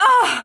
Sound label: Sky Queen Death